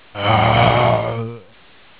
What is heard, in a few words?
zombie attack; rezombie; svencoop